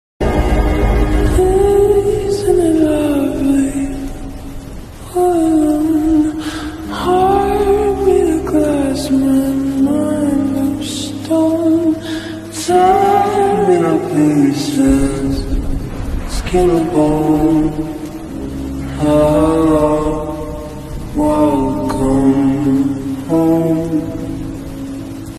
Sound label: slowed reverb english ringtone